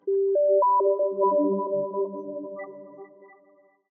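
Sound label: system startup; sfx oculus sys startup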